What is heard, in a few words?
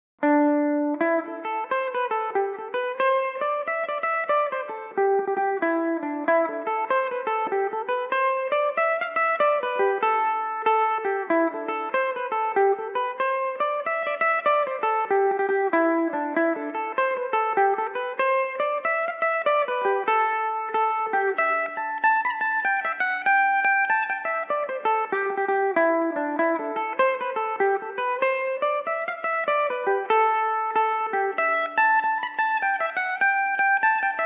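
A minor, irish mandolin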